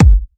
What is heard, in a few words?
Bassdrum; Vengeance Samples; VEE Bassdrums